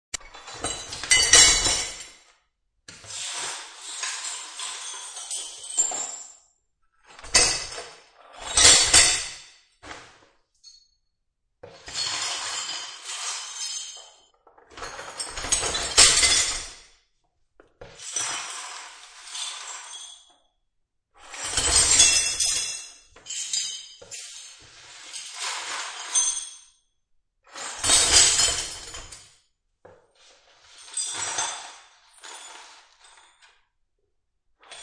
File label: Sweeping and dumping shattered glasses and plates into trash.; Cleaning Glass; Kitchen; Household